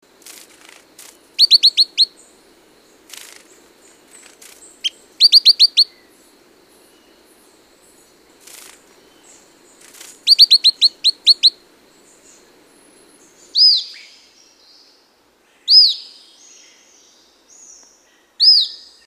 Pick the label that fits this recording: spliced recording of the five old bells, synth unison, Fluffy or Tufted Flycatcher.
Tufted Flycatcher